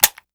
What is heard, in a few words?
Pistol dry fire; Foley; Doom